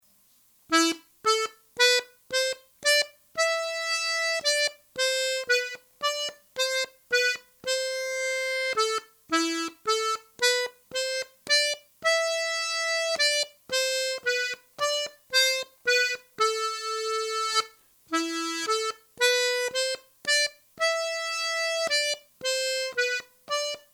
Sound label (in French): Polka provencale; Musique d-ensemble